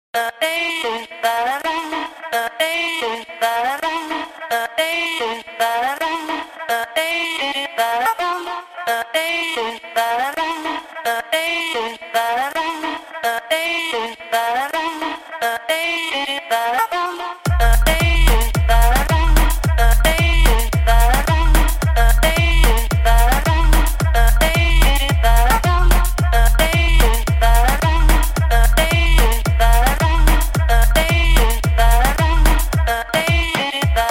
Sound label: Kit Loop Full